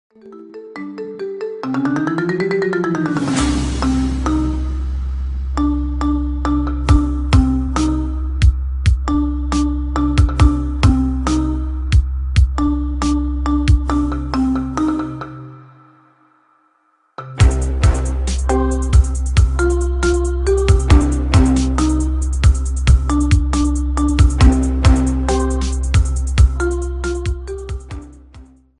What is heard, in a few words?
scary marimba ringtone remix